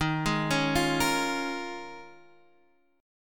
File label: D sharp 9th Suspended 4th D sharp, Guitar DADGAD